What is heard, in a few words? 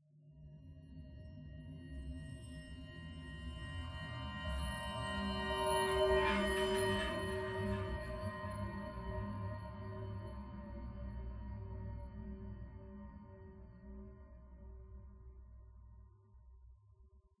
Bells Interior